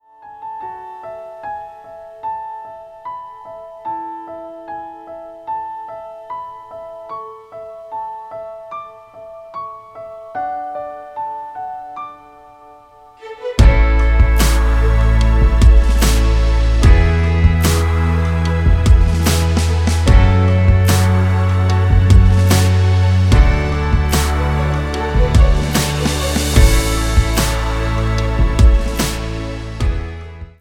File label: flashlight a dur